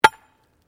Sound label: Digging Pick Concrete Hard; Various digging tools and processes; Construction